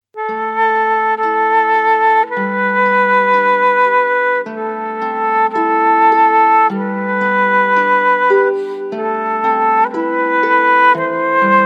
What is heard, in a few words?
SAKURA JAPANESE TRAD